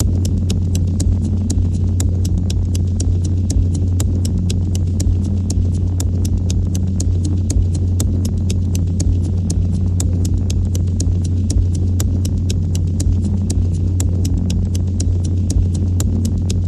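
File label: Timekeeper Seething (120)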